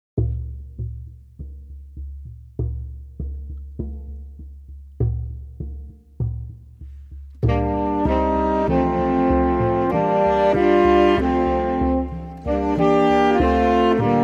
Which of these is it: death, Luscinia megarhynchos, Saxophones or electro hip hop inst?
Saxophones